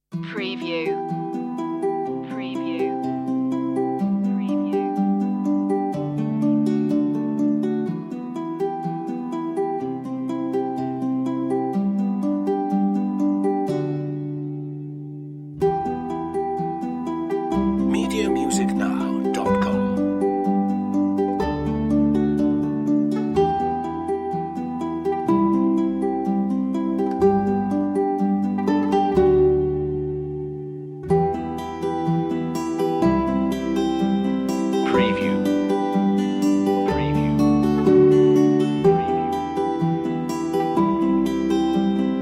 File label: spring journey leisure bg guitar